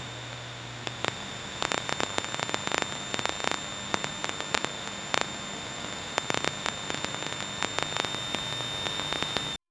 phone pickup sound

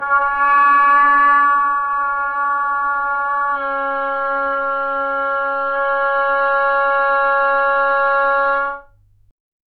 wooden oboe sustained ton; musical instrument

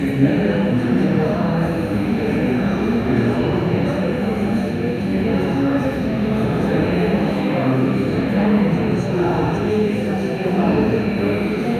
short ambient, room tone

